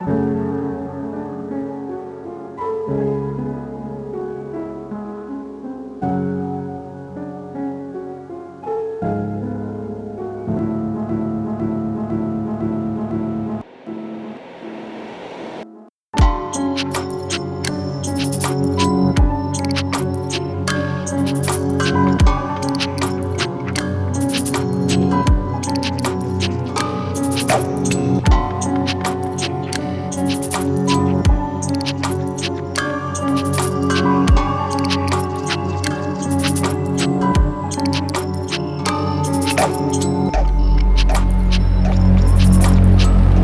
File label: downtempo